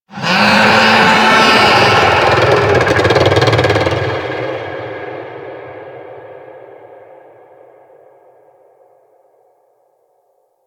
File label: Infested Golem Vocal Attack; Warframe